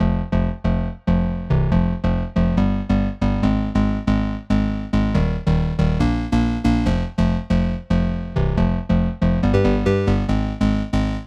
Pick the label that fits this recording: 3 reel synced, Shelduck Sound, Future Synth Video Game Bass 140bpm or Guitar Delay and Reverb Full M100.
Future Synth Video Game Bass 140bpm